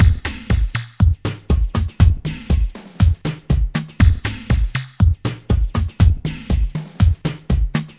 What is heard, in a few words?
120 bpm, nerdy breakloop